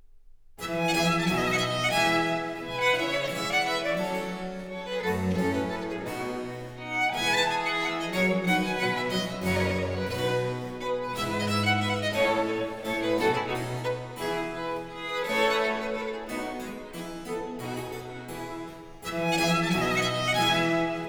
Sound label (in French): Pièces de violes, Suite in F Major; Book 4, No. 30-32; Nicola Matteis; Ayres for the Violin; Quatrième Livre (Paris 1717)